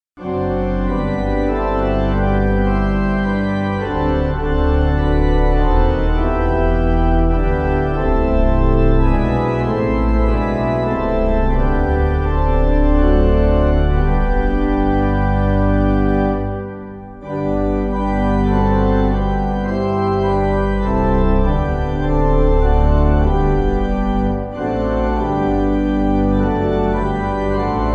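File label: pipe organ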